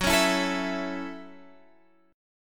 F sharp Minor 7th F sharp

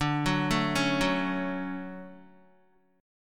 D Major 7th, Guitar; arpeggiated; JGuitar

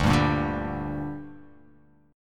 D Suspended 4th Sharp 5th D down, chord

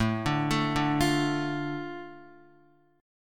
A Suspended 4th Sharp 5th A Guitar; Asus4#5 arpeggiated; JGuitar

